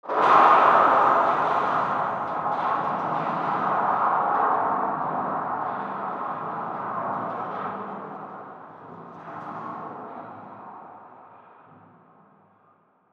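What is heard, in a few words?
mega shipyard metal ambience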